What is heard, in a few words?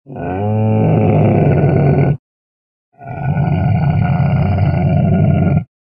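verblud, camel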